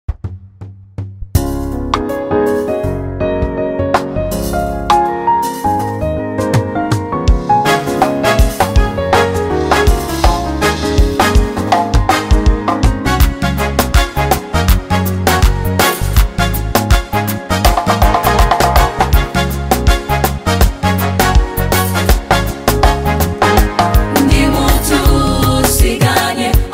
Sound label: choir